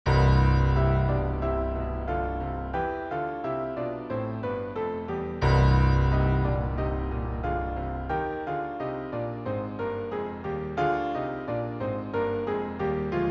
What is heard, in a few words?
6 bells